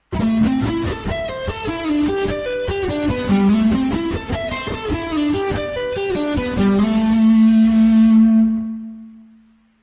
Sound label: mini sweep